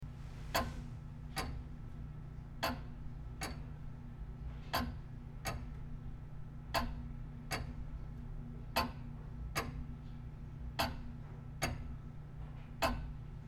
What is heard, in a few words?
tick tock